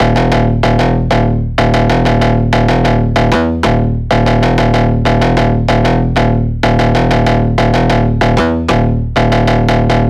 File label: Bass Loop D#m 95BPM; Dubstep; Detonate; Ghosthack - Advent Calendar 2022 - Day 18 - Bass Loops